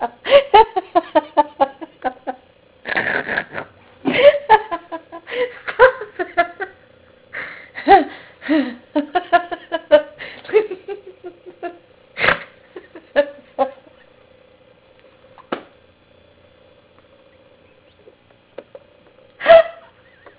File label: haha